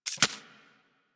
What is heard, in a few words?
Arachnoid Micro Step Snow; Warframe; Corpus